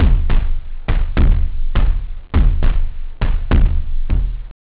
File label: tom, techno; OctaMED SoundStudio V1